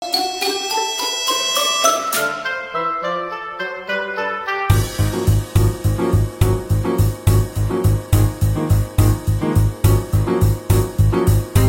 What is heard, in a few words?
Instrumental Track; auditions